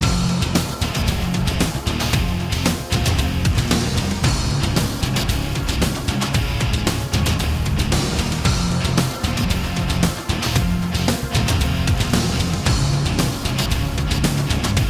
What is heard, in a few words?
bgm boss; Gun Bros 2